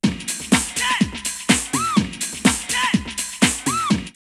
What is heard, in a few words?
Beatmaster 124, drumloops, Techno&House; X-Static Goldmine